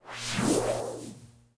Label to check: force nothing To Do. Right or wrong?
Right